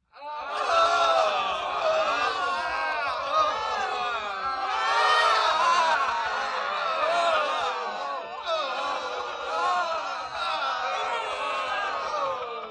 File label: Grp Wailing Painful M